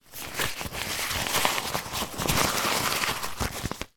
fabric wallet movement; foley

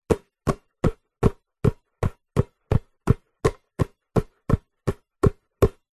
basketball juggle between hands fast; zapsplat

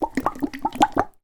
food soup tomato can shake; zapsplat

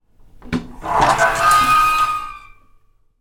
household loft hatch and ladder close up; zapsplat

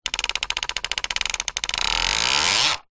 leisure toy car push wheels click slow to fast

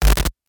Guitar Computer Jack Noise

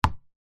handball bounce wood floor single; sport

